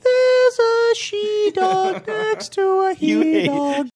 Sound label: singing